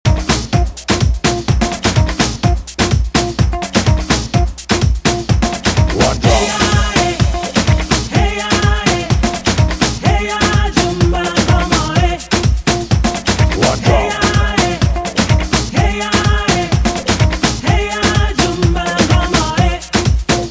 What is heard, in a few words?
one drum